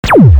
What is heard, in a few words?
laser original